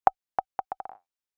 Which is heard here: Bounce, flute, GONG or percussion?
Bounce